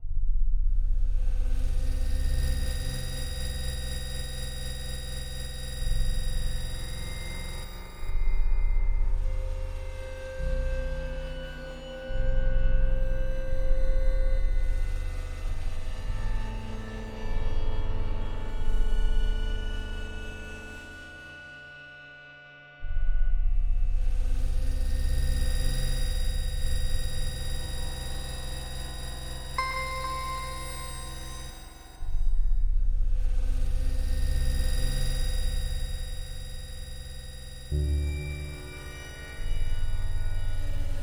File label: glow atmospheric background